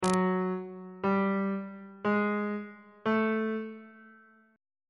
a double sharp 6th interval pitches; bass clef

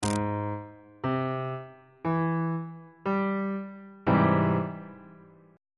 g sharp augmented major 7th chord on bass clef

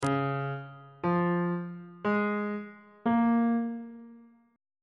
c sharp major 6th chord intervals; bass clef

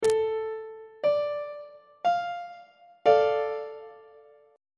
c double sharp minor 2nd inversion; on treble clef

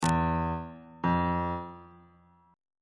e 1st intervals

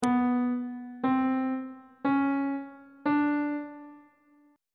c flat 2nd interval pitches; on treble clef

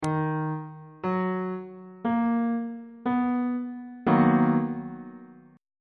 b major 7th 1st inversion on bass clef

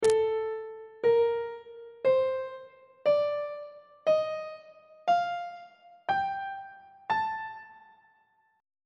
a locrian mode note positions on treble clef

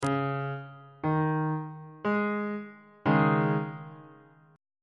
c sharp suspended 2nd chord on bass clef

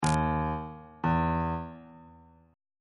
e flat 1st interval pitches on bass clef

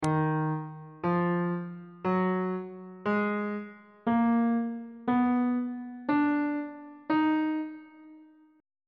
d sharp harmonic minor; key signature on bass clef

